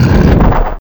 Ice Melee ATTACK

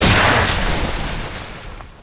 bossmonkey attack; Eft

